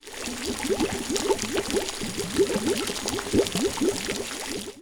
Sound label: boiling waters; nova sound